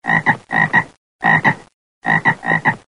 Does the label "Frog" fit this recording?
Yes